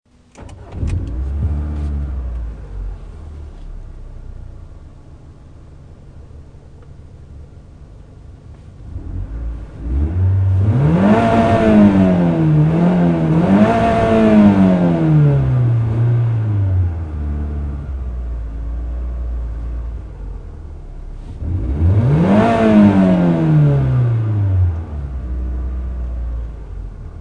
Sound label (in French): ralenti